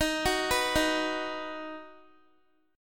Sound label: D sharp Minor Sharp 5th D sharp down Guitar; arpeggiated